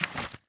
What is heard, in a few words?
info move